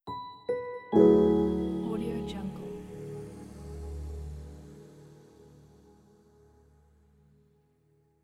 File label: suspended logo